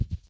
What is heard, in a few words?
Step